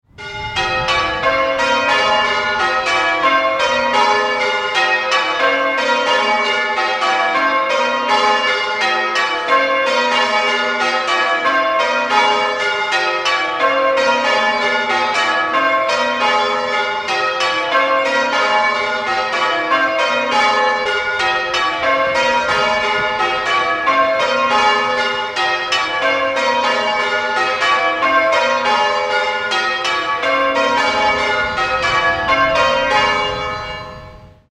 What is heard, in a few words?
Woolpit Rounds, bells